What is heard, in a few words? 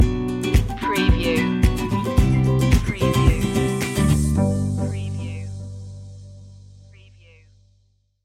easy travel stinger